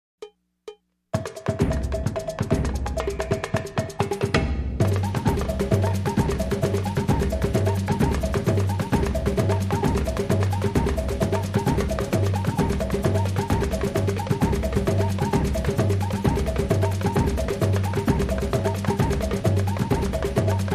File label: Carnival Loop